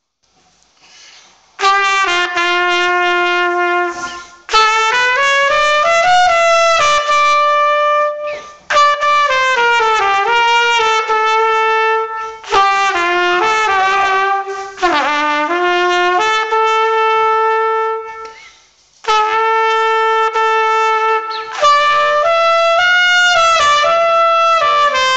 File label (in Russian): Труба